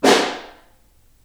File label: Far, Snare